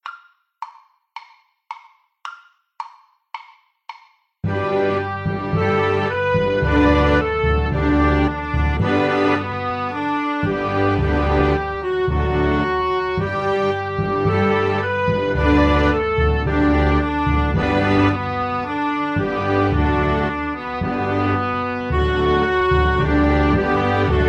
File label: ode to joy, recorder; beethoven